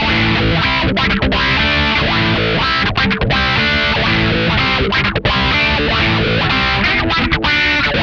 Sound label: INDIWAH, Funk Guitar; 90 s Sample CDs; Zero G